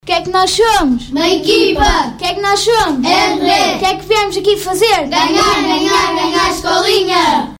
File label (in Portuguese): grito escolinha